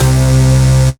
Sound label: Bass C, Offbeat Bass